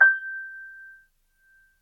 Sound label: Grand Piano, Kawai, Sustains, G5; Chordophones